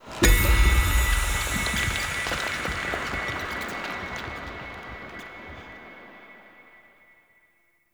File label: Elixir Pickup; Objects; Pickups; Verminia